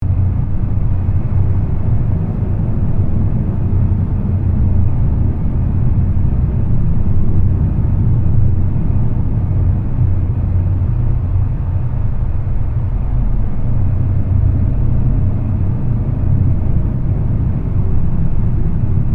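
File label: Ambience Dead Ship Creepy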